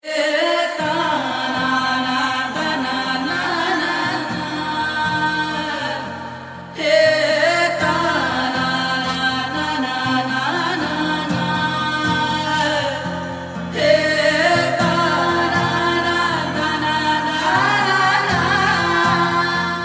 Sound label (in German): Klassische Sufi